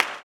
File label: CLAP, blues & soul; ILIO; Vocal Planet